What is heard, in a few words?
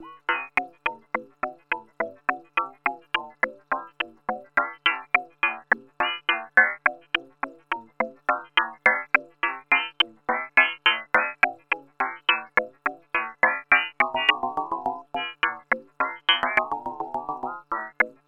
Panharmonium Water Log Percussion; Rossum Electro-Music